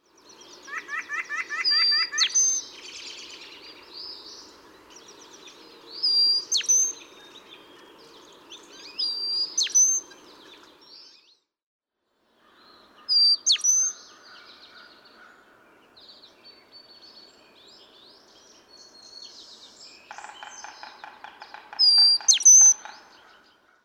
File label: Brown-headed Cowbird